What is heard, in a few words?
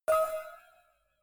cowbell clank soft